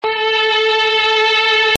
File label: MELOTRON